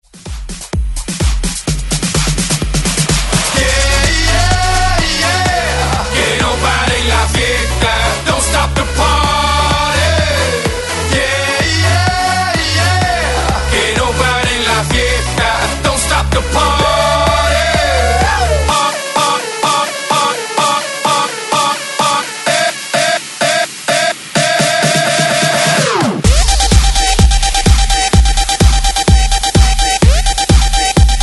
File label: Peak Hour Club Banger 127 Bpm